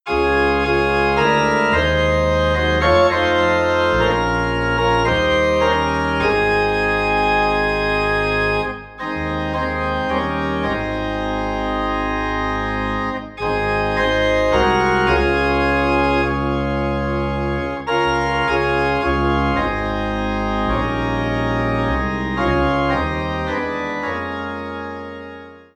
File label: Organ in A Flat